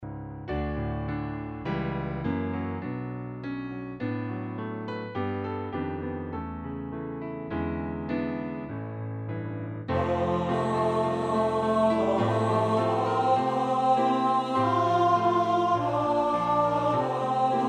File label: Hark The herald angels sing tenor